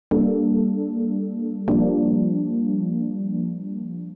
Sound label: ORGAN 96 G; old school